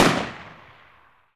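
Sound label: galil exterior shot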